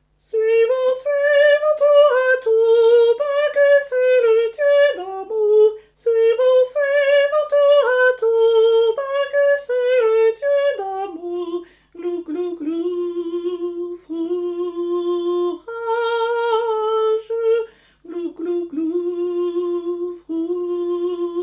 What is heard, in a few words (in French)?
tenors